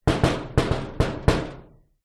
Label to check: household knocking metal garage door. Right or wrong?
Right